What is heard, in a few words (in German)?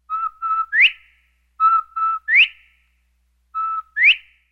Virginiawachtel Colinus virginianus; Vogelstimmen